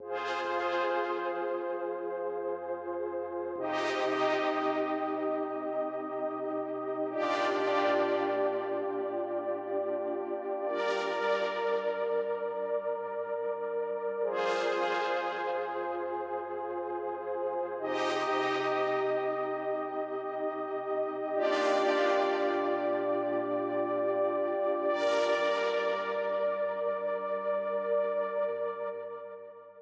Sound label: 135 BPM C Min Pad, Hip Hop; Melody Loops; Loop Stems; Cymatics; She Goes